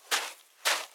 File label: Footsteps Sand; Idle Champions of the Forgotten Realms